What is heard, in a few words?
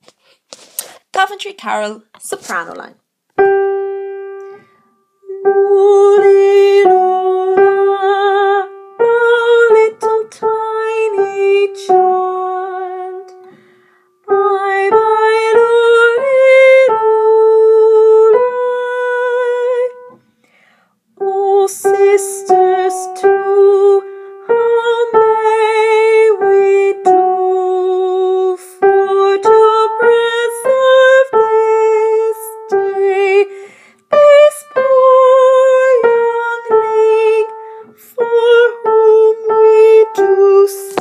Soprano; Coventry Carol